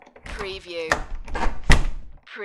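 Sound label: Lock Front Door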